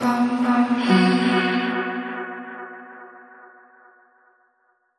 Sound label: Serendipity Vocal Hums